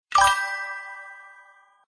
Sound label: correct item; disney-junior; mickey-s-super-adventure